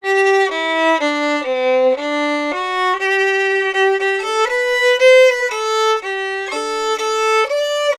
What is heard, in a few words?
Fiddle, Appalachian